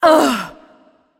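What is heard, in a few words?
surprised, female; smite; voicepack; hera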